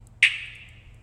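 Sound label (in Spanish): activacion, ringtones de alarmas; Sonidos Positron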